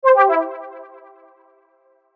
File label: menu sound effect